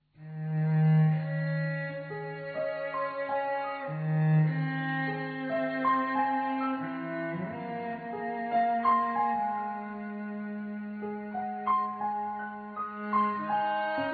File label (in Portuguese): Musica